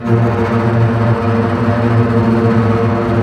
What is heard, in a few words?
STR DB TRM, Symphony Orchestra, STR Cbs Tremolo; Roland LCDP08 Symphony Orchestra; STR Cbs Bow FX